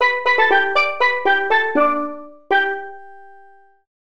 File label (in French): carillon